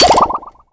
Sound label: MagicItem Factory Spin